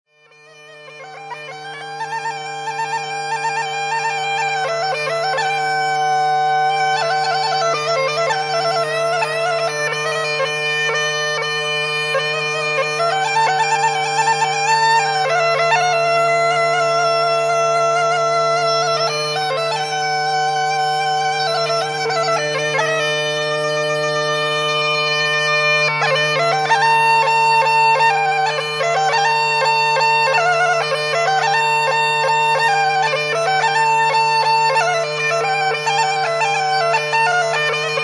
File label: gaida